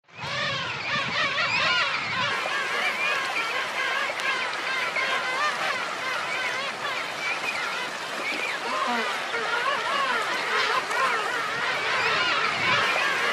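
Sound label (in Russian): petrels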